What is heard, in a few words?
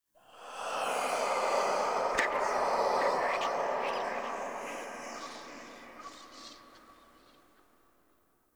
Room Whisper; Ambience; Dante Unbound; Warframe; Entrati; Dead End Secret